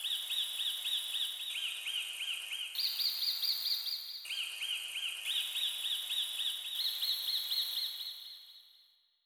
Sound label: Birds